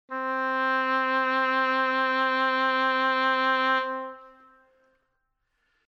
Spektrum Oboe C

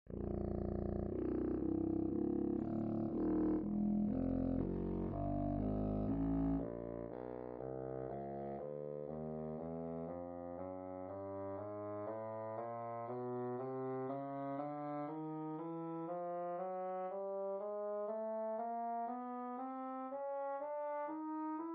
Kontrafagott; Instrumentenkunde

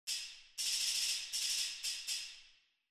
Kastagnetten

